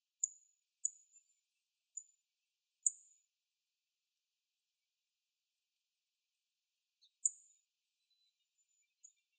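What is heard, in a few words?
kashiradaka call, rustic bunting; jinaki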